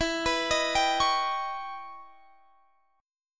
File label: E Minor Major 13th E; EmM13 arpeggiated; x,19,21,20,20,21